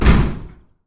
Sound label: Garage Door, household rhythm samples Hits